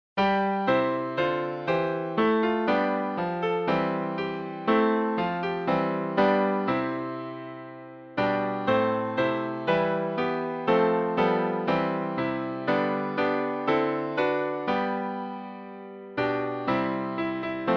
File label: Hymns